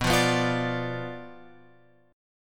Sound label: B Diminished B; Bdim Chord {7 8 9 10 X 10} strummed